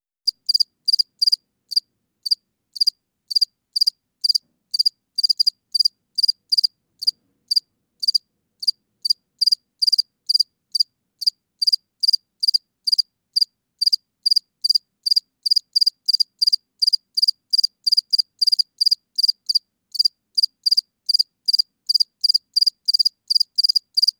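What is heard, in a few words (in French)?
grillon domestique acheta domesticus, stridulations; naturphonia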